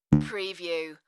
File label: Bass Doing